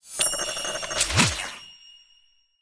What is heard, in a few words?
vanish Scatter From Reels; lost relics